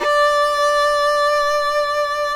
FIDDLE NOTES, D5, L

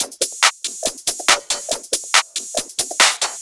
drum Loop 140BPM Full

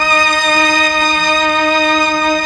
STR, STRING

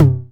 909 Tom; EMU Orbit-3

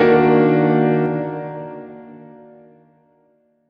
ambient piano (A); Sonic Foundry (Sony Creative Software); Ugly Remnants VOL-2

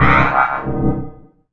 Single Electric Basses, Dance Floor Bass

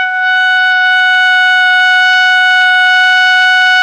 ALTO PP G 4, saxophones; Greytsounds - Philip Wolfe David Crozier's Saxophones

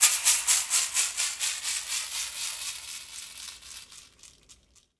Cascade Ending, Shakers

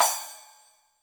Splash Cymbal, YAMAHA RM1X

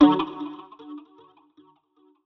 Guitar Chords Dmaj; Zero-G - Future Wave

